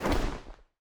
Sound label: Jump; bloom minigame